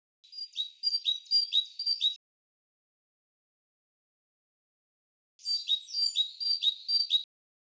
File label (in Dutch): koolmeeszang; Mezenprojecten in Fort 7